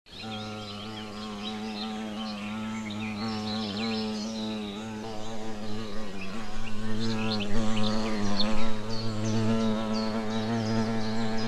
Hornisse Fluggeraeusch

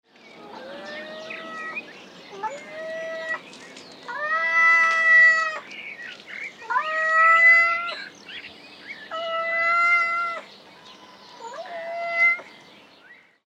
Wildkatze Maunzen

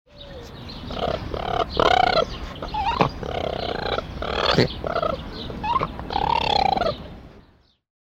Waschbaer Rufe Laute Umherlaufen

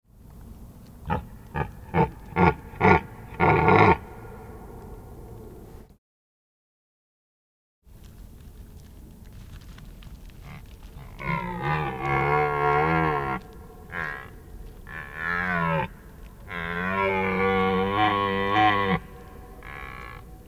Cervus elaphus Brunftrufe Männchen in Begleitung 6 Weibchen

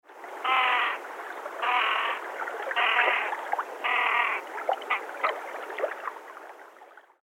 Haubentaucher Rufe

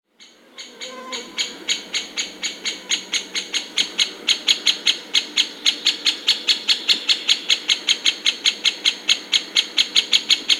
Buntspecht Rufreihen

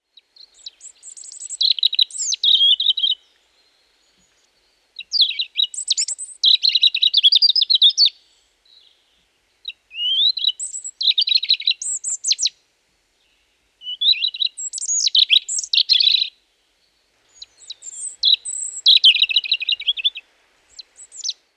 Rotkehlchen Gesang

